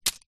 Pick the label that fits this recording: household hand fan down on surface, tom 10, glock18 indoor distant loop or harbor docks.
household hand fan down on surface